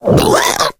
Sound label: female warning; video game; source engine; left 4 dead 2; boomer; voice